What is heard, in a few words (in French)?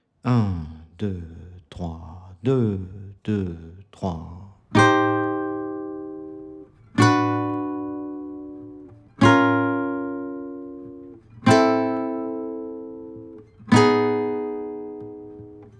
exercice petit barre sur 3 cordes exercice d 080bpm, guitare